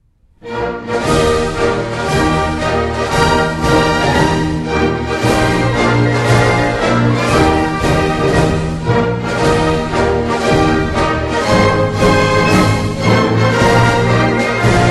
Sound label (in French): hymne costa rica chanté